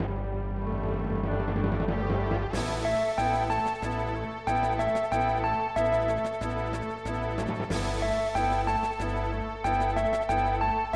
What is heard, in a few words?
harp; heracles no eikou iv kamigami kara no okurimono; snes